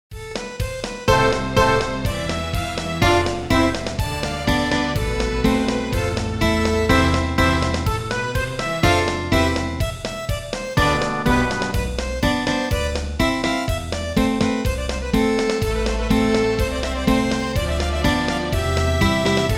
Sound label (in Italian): Polka